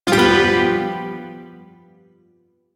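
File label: Shawzin Major Chord; Warframe; Duviri Shawzin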